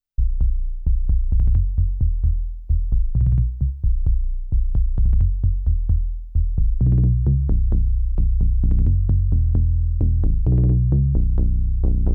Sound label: Dubby Sequence